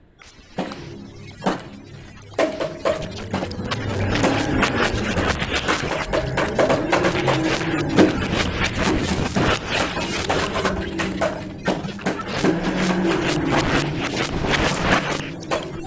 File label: Shuffling Of Feet In An Empty Lounge